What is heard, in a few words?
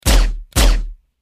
Silenced Single Gun